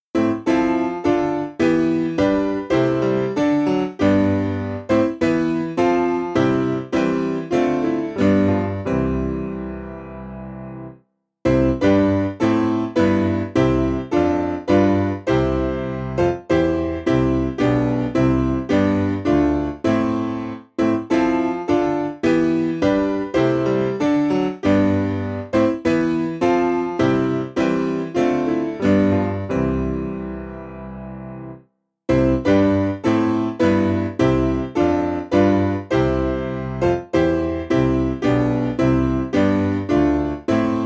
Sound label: Piano